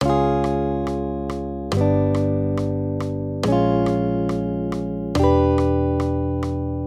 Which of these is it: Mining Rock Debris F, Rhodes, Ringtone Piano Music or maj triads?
maj triads